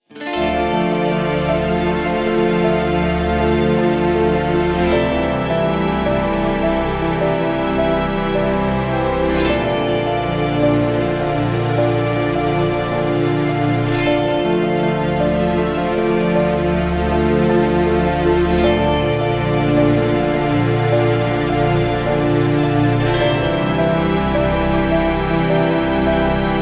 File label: dramatic guitar; by burak sound bank; dramatic dram vol 17